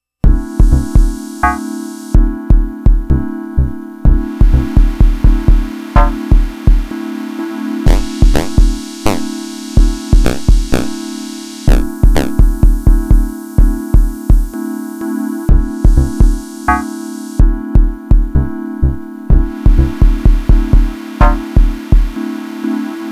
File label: frog pond fiddling; weeklybeats-2020